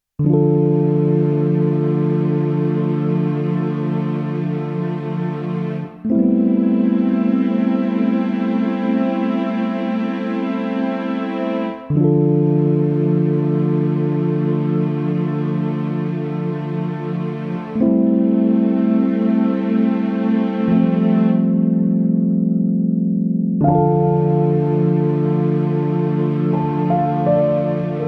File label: Piano Soul Short Instrumental